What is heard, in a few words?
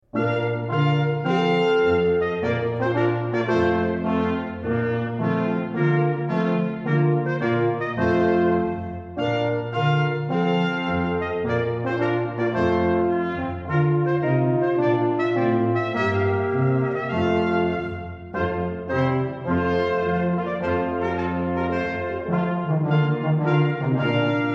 Trumpet Tune